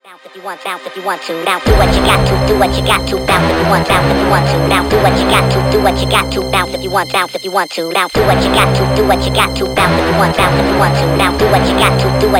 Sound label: Liquid Crystal Cosmic Funk; ruff hard core